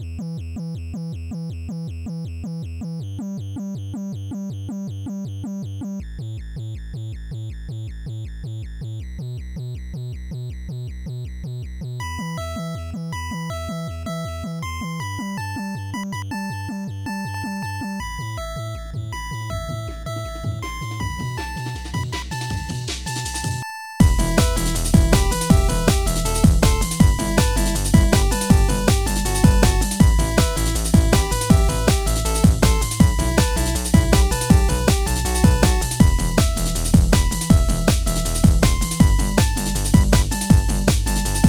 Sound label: chiptune meets drum n bass